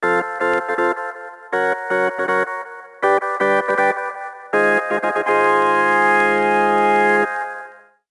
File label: Organ